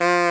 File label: Horn, cartoon Foley Fx; musicradar; cartoon caper